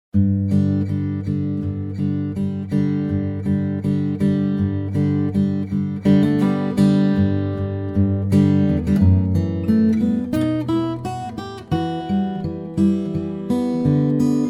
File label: guitar